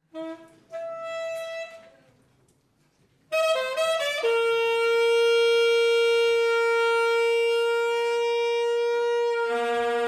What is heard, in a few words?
SAX MAFIA